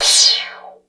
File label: Crash, Korg DSS-1